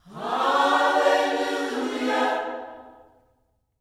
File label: HALLELU, Gospel; Spectrasonics; Vocal Planet; HALLELUJAH